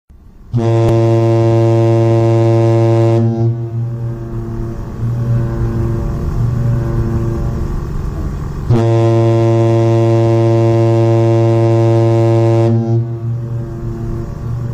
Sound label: Ships Horn